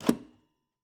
Cabin, outer doors, double doors, tension, latches, wooden, screens, slide bolt, hyper; Eiravaein Works; Vaeyan IV